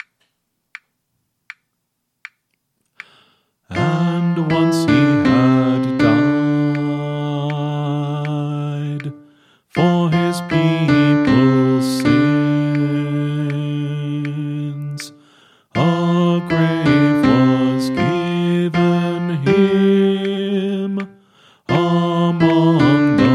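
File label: Song, Choir Practice Tracks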